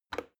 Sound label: plastic button start push regular